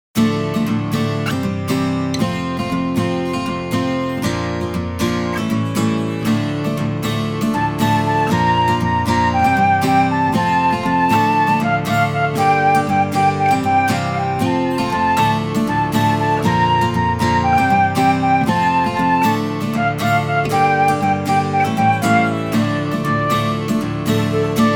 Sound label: INSTR